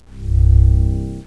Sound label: respawn; jb engine